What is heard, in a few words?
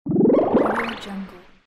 double bonus